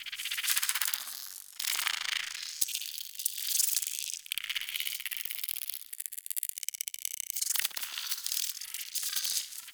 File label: Landscape Insect Loop, Stereo